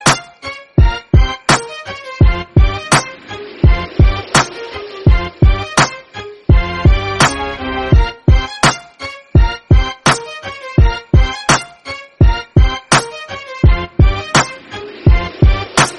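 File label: background music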